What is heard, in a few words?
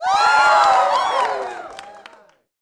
tax cheer, petitioners